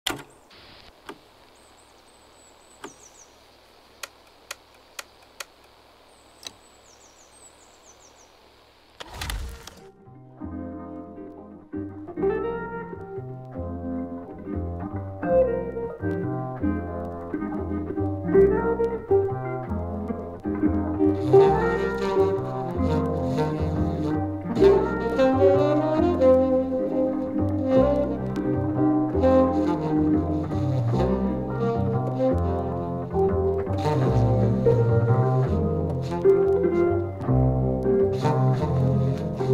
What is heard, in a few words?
International Soundwaves of Jazz-Funk and Spiritual Fusion